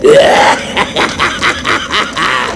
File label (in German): lachen; horror